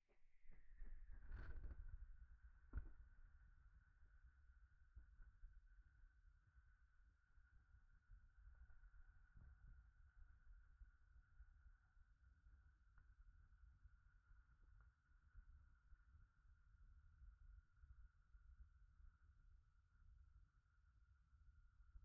軽く耳塞ぎ R; 3DIO; 耳かきに関する音

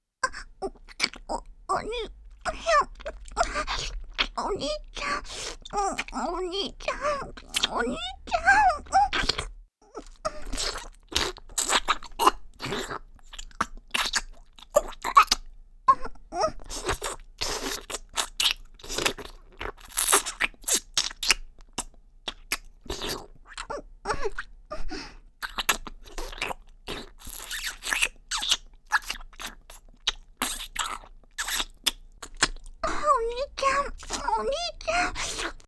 キス(ディープキス)

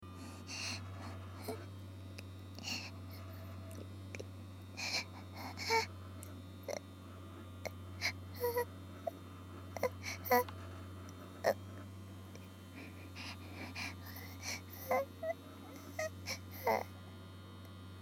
電マ寸止めオナニー; 没音源、奴隷ちゃんの電マ寸止めオナニー

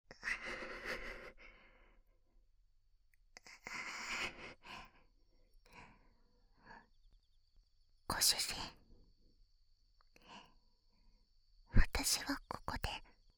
添い寝; ご主人から抱擁されないと、生きてけない…。

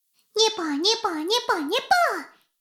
シコシコ音声; 古き城のドラゴニート2

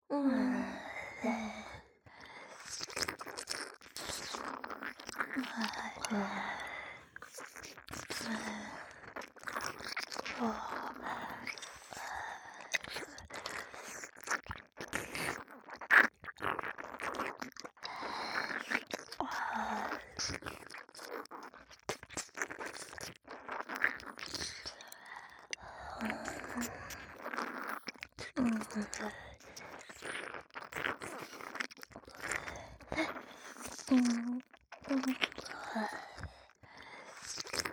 両耳舐め ループ

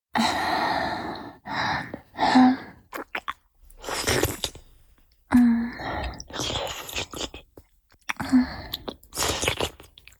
乳首舐め(弱):乳首を軽く舐める, チュパ音; チュパ音抜粋集

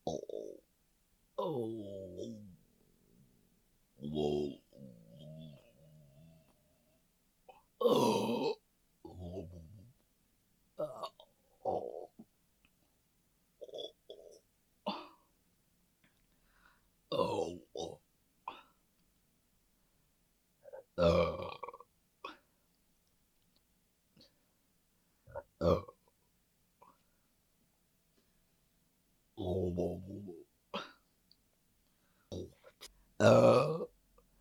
ゲップのみ

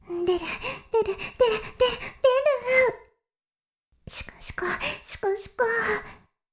しこしこ音声, ASMR; 白くて黒い魔性円環

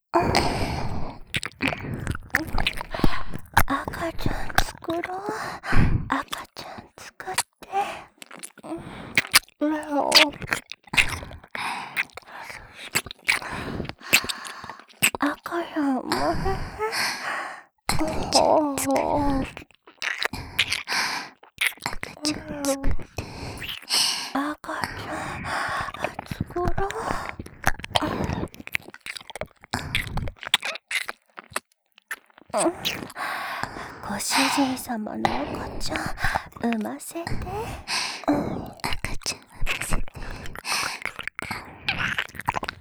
赤ちゃん作ろ 耳舐め

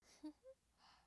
笑い声; ループボイス

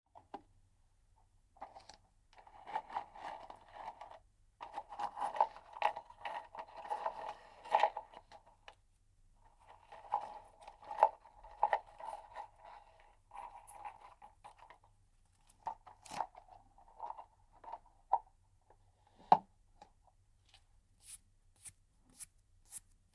綿棒 耳かき 左側; 眠くなる音たち

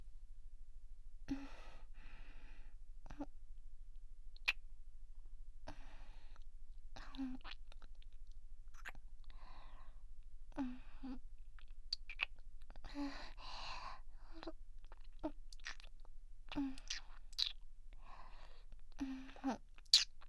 濃厚なキス

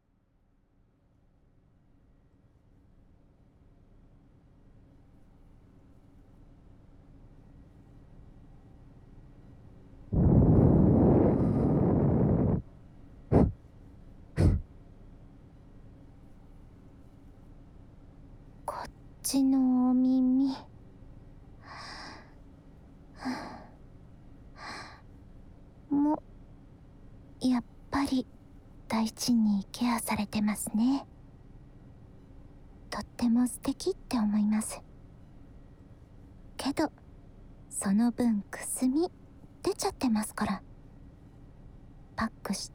お耳のパックと、耳かきと・左耳（ピールオフパック、耳へのクリーム塗布、耳かき、パック剥がしぴりぴり), ASMR

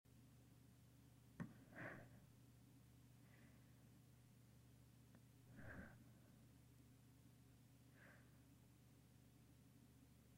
寝息

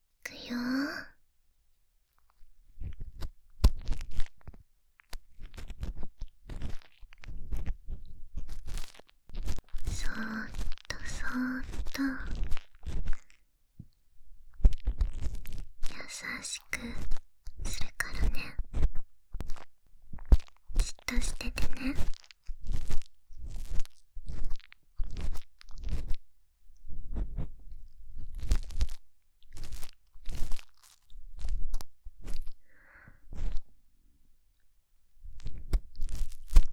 耳かき、ぼん天（右耳）; お姉ちゃんに耳かきさせて～2人きりの癒しの一日～

